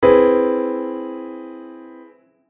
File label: C aug M7